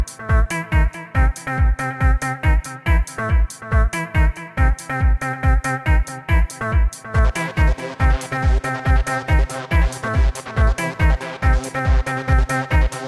high frequency, electronic ringtones

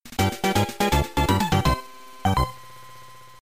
Game Over; KSS

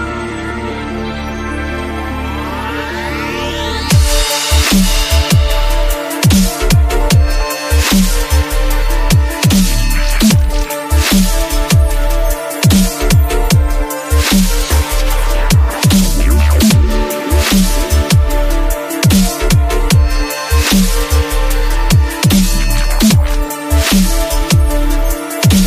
electronica dub

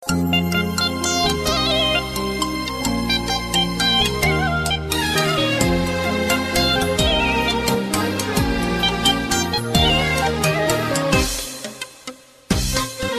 arabic ringtone

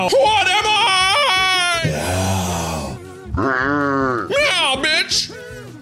Meow; Markiplier

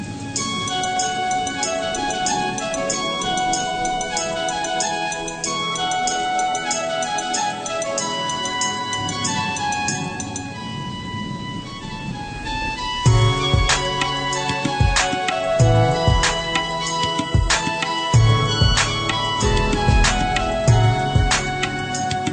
Melody Instrumental

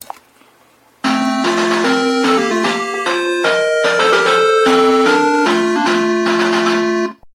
FDS Startup Theme

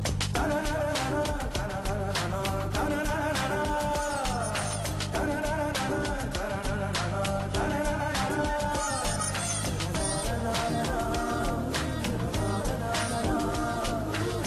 kadhal bgm

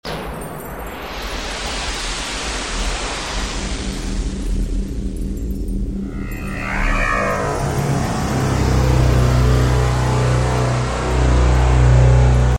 Space ship

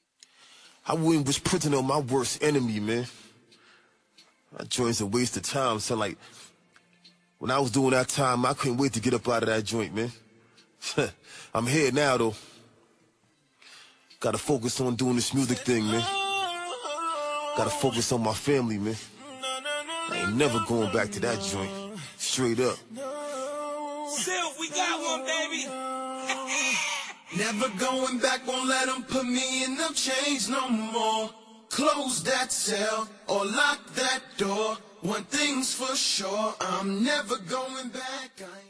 acapella

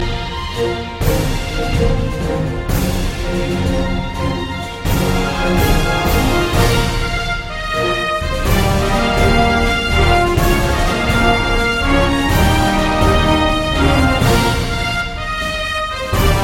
victory

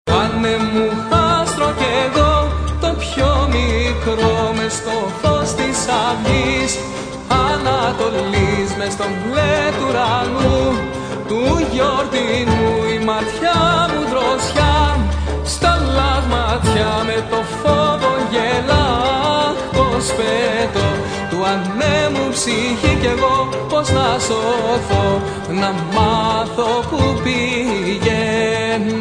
ambiental greek

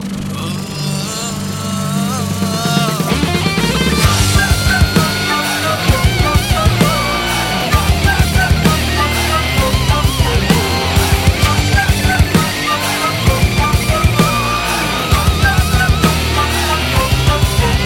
flute; rock

